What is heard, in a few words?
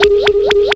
PERC FX